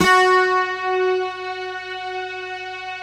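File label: Bright Guitar Strings; Optical Media International - Sonic Images Library; Eastwind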